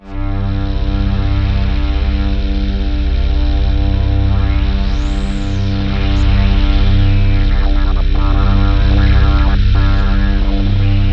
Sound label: C1, Synth Line; 90 s Sample CDs; NiceBeats; SynthLine VOL-17